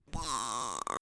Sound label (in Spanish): 1133hz mf medio